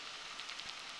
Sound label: Boat Noise